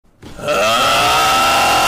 ahhh; ishowspeed

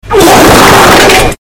fard sound but its loud

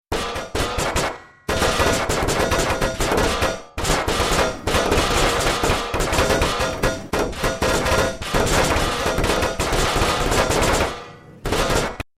metal banging sounds; gmod

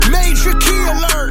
major key alert

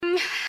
breath

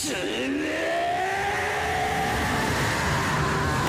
naofumi screem

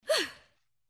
phew

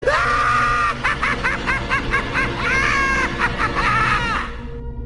joker laugh; kahkahas

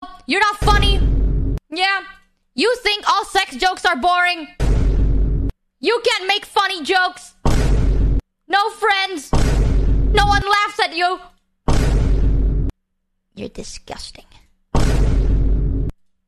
vine boom

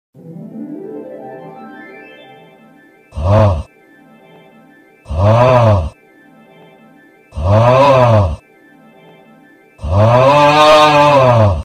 augh zoom

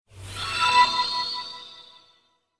level up

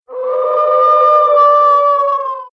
wolf howl

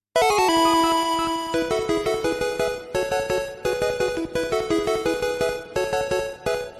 win follow alert; pkmon

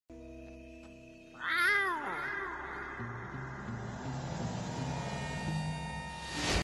ocelot meowing

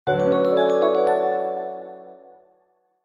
new message

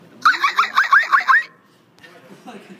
dying poodle

